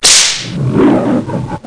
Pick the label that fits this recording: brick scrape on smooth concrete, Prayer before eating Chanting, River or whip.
whip